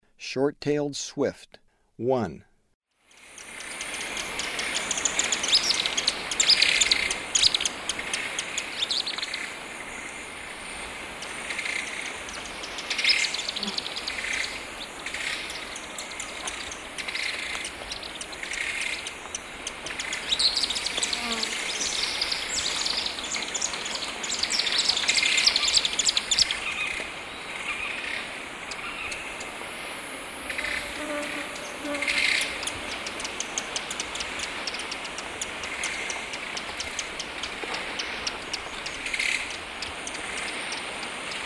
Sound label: Short-tailed Swift; S. America; Eastern Lowlands